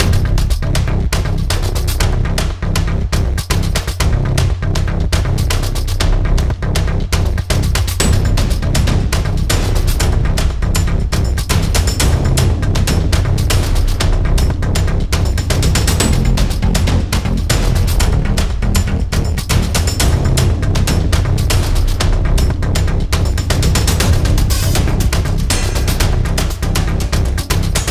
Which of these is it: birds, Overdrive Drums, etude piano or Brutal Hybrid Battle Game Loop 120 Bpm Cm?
Brutal Hybrid Battle Game Loop 120 Bpm Cm